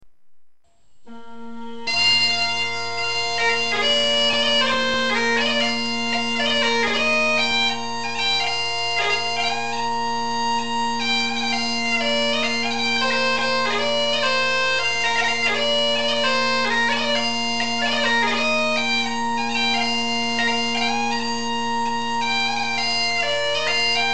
Irish Favorites, Bagpipes

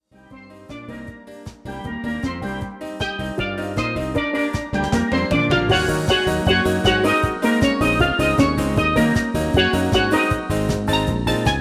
Steel Drum Band

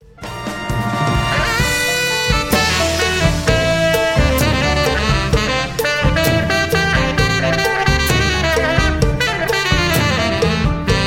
solo excerpt with big band, Saxophone

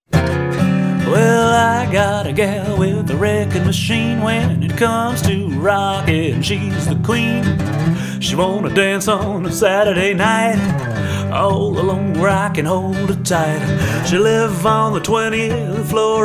acoustic guitar

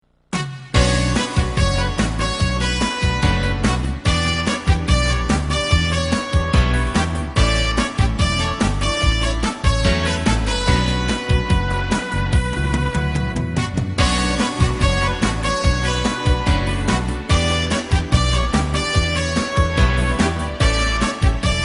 Violin; Eclectic Violin Music

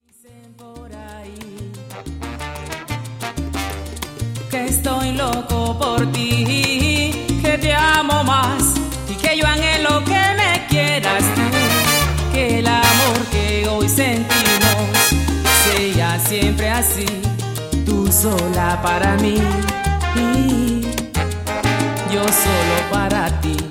Salsa; jazz-band

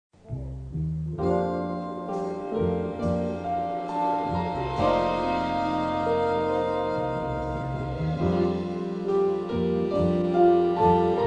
swing

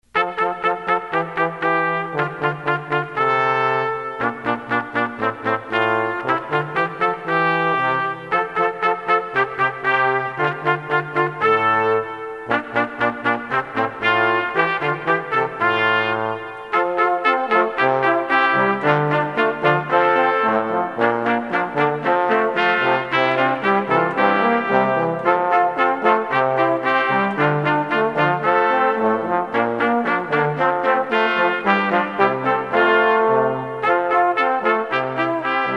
brass quartet; Jolly Old St Nicholas

